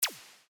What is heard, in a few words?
zap